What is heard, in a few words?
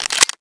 clip in, weapons; p228